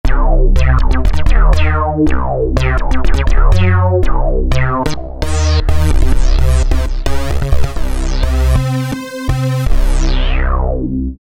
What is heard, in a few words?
Bass, Alesis Micron; AcidAndOilBass; Factory Programs